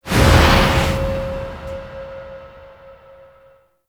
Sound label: Stadium Stage Group Transition; Gameplay; Warframe; Nineteen Ninety Nine